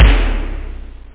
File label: toms, room; perc; OctaMED SoundStudio V1; jazzies samples